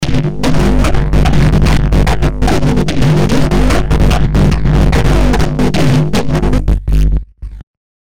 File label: kick